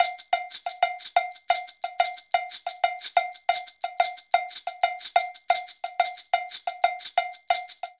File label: Fusion 6 8 Maracas Cowbell 120bpm; Latin Percussion; Ableton; Factory Packs